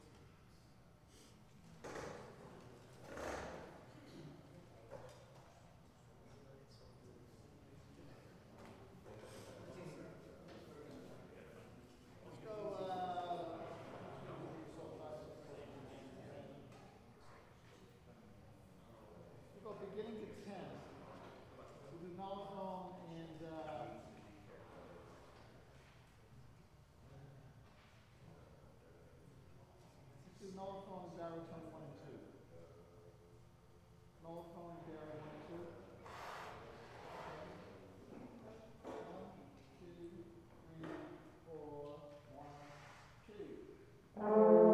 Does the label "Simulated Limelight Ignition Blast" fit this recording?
No